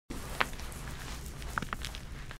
gecko scratch; npc

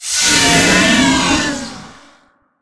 spider threat

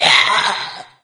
pain left arm; doom3

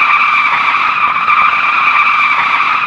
surface asphalt; vcmod

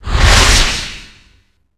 magic charge

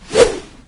attack swish, maggot; doom3

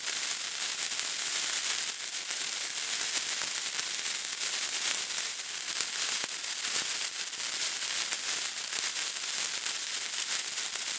tnt spark loop

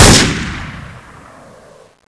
fire, bizon

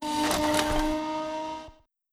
legs open, mining rig

